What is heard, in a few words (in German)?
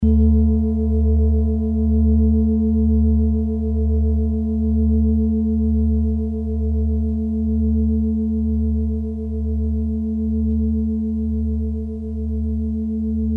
klangschale nepal, Klangschalen Nepal